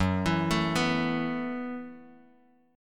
Gb Minor 11th Gb, Bass Standard, chord; arpeggiated; JGuitar

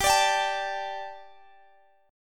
A 7th Suspended 4th G

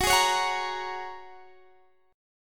Ab 7th Suspended 2nd Suspended 4th, Gb

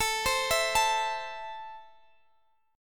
A Minor, chord strum; x,24,22,21,22,x; arpeggiated

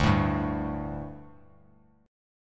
D Suspended 4th D, down, Bass Standard; strummed

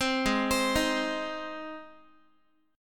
Ab Major Ab down Guitar; 15,16,15,13,x,x; Ab arpeggiated

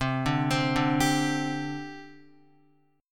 C Suspended 2nd, C, down, Guitar; Csus2 arpeggiated

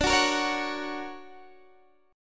D Augmented Major 7th Guitar Drop D, chord

